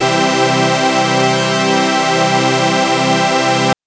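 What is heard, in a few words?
Synth Ensemble major c3; TrashLibrary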